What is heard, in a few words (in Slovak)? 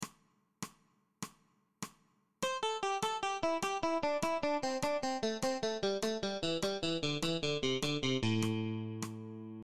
osminove trioly nadol tlmenie; bluesova pentatonika cvicenie; zapastim